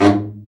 BRA BARI, Bariton Sax

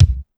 Kick, Pitched Up; Clavia DDrum-AT

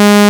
JX SAW; INIS; Vintage

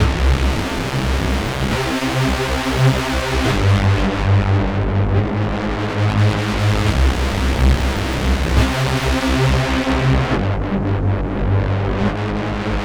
Darkest Rave, Pads; KJ Sawka; Drum n Bass & Beyond

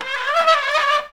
PULL, Anime Sound FX; COMIC

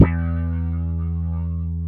WOW GT1-E1, WOW GUITAR; AKAI S-Series CD-ROM Sound Library VOL-7

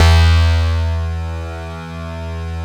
PERC BA; Synclavier Sampler Library; KEYS AND GUITARS

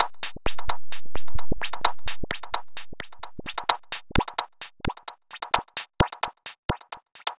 Subtractive Beat

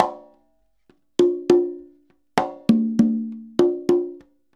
CONGA, Modern Percussion Loops; Soundscan vol.56; AKAI; CONGA 10G

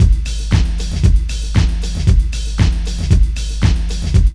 DISCO LOOP; Zero-G; Funky Elements

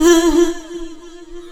HOUHOU VOX, ADLIB WOM, Funky Vocals; USB Soundscan vol.18

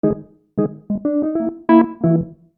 Keyboard, Soul; Sonic Foundry (Sony Creative Software); Infinite Soul VOL-1

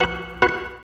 Organ Lick; Ambient Grooves; Organs Licks

